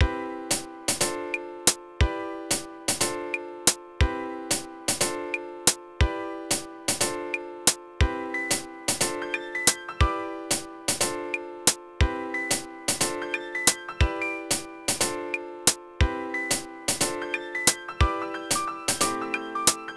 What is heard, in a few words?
ice theme